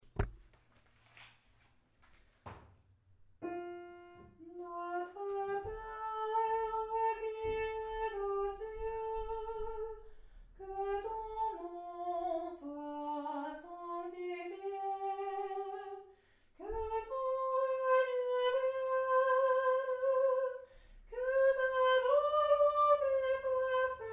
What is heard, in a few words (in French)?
Notre Père, Soprano